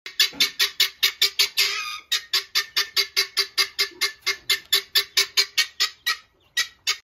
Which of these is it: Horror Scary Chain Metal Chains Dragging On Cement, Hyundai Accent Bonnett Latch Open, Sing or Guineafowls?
Guineafowls